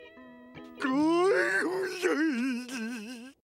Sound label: Laughing; Patrick Star